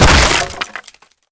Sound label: break wood plank; physics; breaking